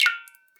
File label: Drips